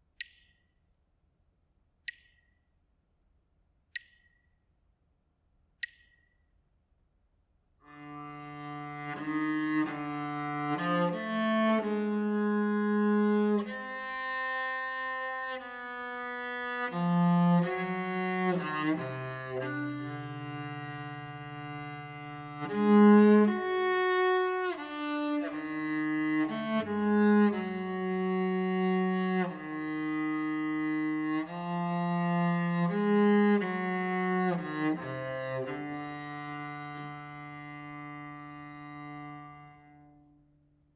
melodic dictation, partially completed melodic dictation; ear training